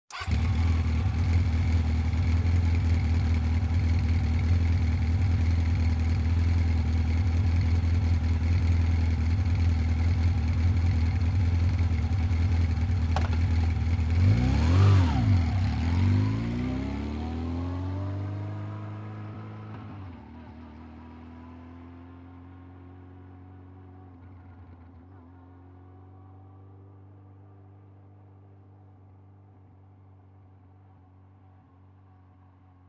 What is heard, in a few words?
Motorcycle starts, waits, drives away